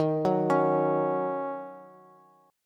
Eb Minor 7th Eb Bass; arpeggiated

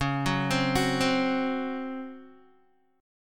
Db Major 7th Suspended 4th Db down Guitar; arpeggiated; JGuitar

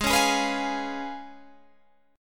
Db 7th Ab, guitar

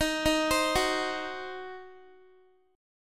D sharp Minor 6th D sharp down Guitar; arpeggiated

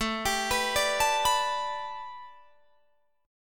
A 7th Suspended 2nd Suspended 4th Guitar Drop D; A7sus2sus4 arpeggiated

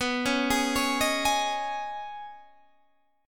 Db 7th Suspended 2nd

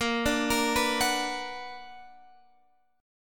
B Minor Major 7th

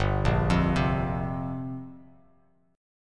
G Minor 11th, Bass Standard; Gm11 arpeggiated; JGuitar

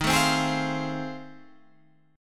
F 7th Sharp 9th D sharp

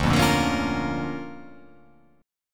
D Minor Major 7th Sharp 5th, D

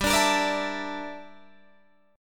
G Minor 6th Add 9th G down Guitar Open D

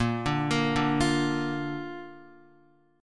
A sharp Major, Guitar; arpeggiated; JGuitar

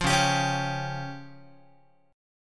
Db Major Flat 5th, Guitar; strummed; JGuitar

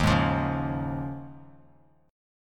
D sharp 9th Suspended 4th D sharp, Bass Standard; D#9sus4 strummed; JGuitar

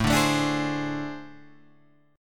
A Minor Major 7th Double Flat 5th, down

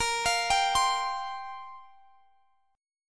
C 7th Suspended 4th, guitar

